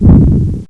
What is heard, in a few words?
explosion; RocketPatrolMods